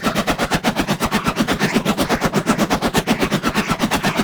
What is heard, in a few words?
Board Sword Loop; Warframe; Weapons; Sundial Board Sword